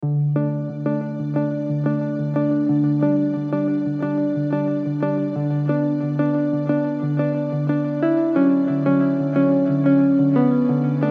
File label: angelical loop